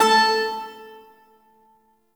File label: Shawzin Oct Two A; Warframe; Duviri Shawzin